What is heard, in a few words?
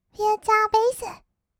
future bass; 音楽ジャンル; 1200 kawaii voice!!